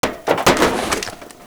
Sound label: Inf Weapons Foley 3rd; Red Orchestra 41-45